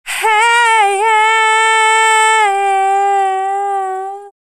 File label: melo stretch; ストレッチ; Melodyne; ＤｅｃａＢｕｄｄｙ; 音を伸ばすと最後のビブラートが、気持ち悪くなったので・・・